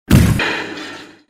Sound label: Car Crash